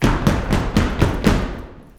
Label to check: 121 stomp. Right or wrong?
Right